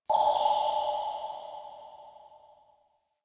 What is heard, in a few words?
Drips, Asteroid; Ambience; Warframe; Grineer Asteroid